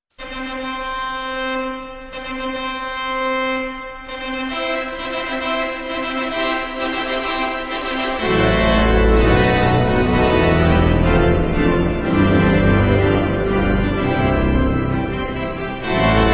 wedding march; mendelssohn

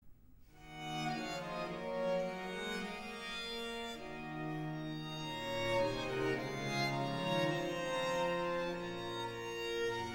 Ritornello; Monteverdi; L Orfeo; Early italian opera

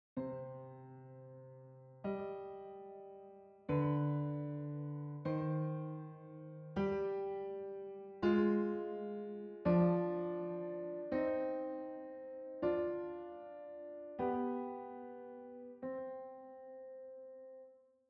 Piano

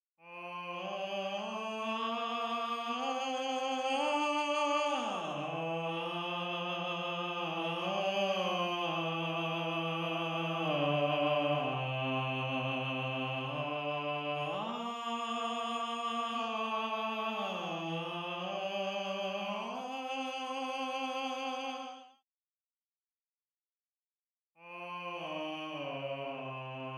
Tenor, counterpoint

